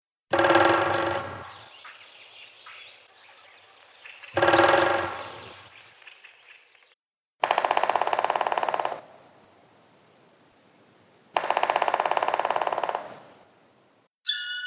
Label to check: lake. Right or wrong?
Wrong